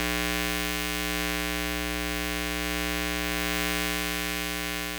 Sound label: Electromagnetic Fields